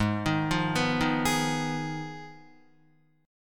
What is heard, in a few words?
Ab Minor 6th down, Guitar; arpeggiated; JGuitar